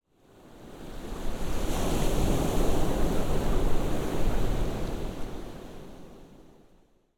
Ocean Wave Crash Distant Obstructed; Ocean Ambience; Sound Spark LLC; Caves, Crevices and Waves

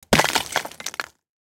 Hit Rock Debris; Mechanical Wave; Rock Brick and Dirt

